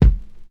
high quality phat bass drum single hit a sharp key; Duration 0:01; Samplerate 44100 Hz; Channels Mono